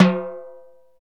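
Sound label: PERCUSSION HI TIMBALE, Latin; Roland L-CD701; PRC Latin 3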